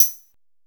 RING HAT, SYNTH PERC; Zero-G; Ambient 1

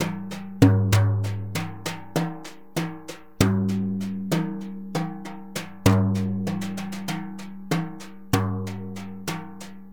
Synclavier Percussion, World & Orchestral

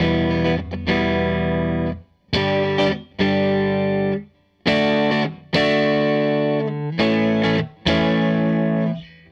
Gretsch Guitar Loop; Sonic Foundry (Sony Creative Software) - Six-String Orchestra

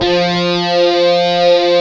stick, Chapman Stick; E-MU Formula 4000 Series Vol. 3 – Analog Odyssey

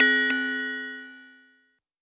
Tibetan C; M8; Electronisounds; Synth Prophet One Shots

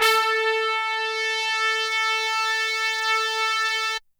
HORNS A3 R

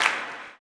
CLAP, blues & soul; ILIO; Vocal Planet

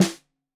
Snare 65 Reg Stereo, Deeply Sampled Drums

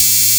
saw lead synth

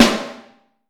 Vintage Snare Drum; Q Up Arts; Johnny.C's Vintage Snare Drum; 4X14 JCSM

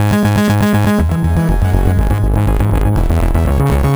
Eerie Haunt Ab 121, Synth Loops, 121bpm; Zero-G; Soundclash; Nu Dance Electro

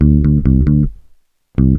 PUNK 134 D, Bass Grooves; USB Soundscan vol.30; AKAI

